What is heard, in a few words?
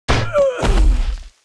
Mob die; Monster; 북해빙궁; 빙령주